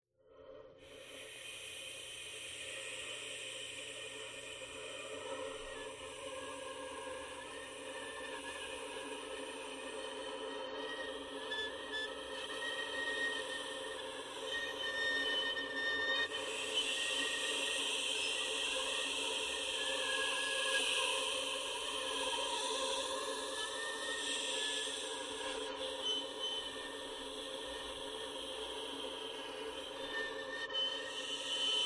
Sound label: Whisper